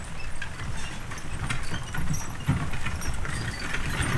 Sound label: Horse Drawn Wagon; Electa Quinney